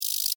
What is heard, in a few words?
Beetle Rattle, Animal, Beetle, Rattle, Vocalization, Insect, Bug; Beetles